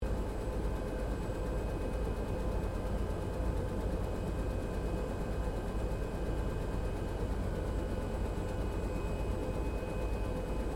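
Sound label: helicopter Bell UH1 Iroquois idle interior; RAN UH-1 Iroguios; Helicopters (Sound Effects:Vehicles)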